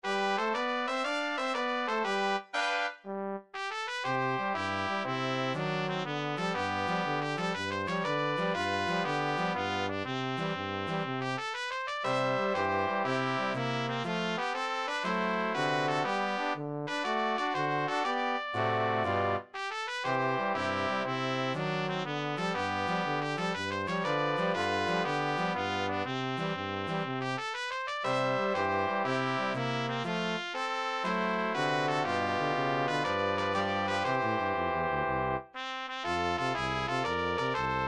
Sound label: classical tubas